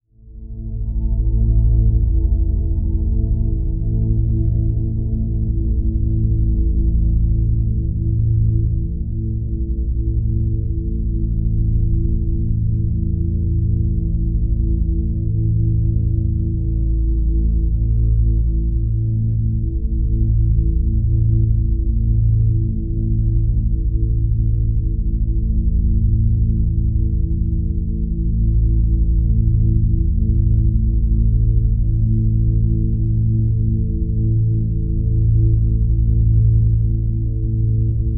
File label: Drone Music Constant Fluttering; Production Element Imaging; AdobeCC